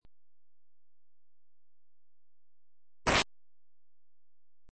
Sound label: sword